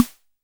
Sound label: Snare, Clavia Micro Modular